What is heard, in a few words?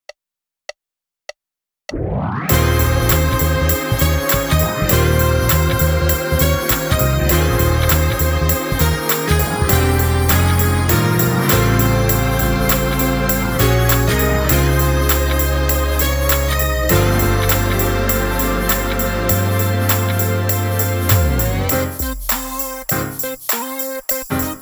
Jingle Bells REMIX drumless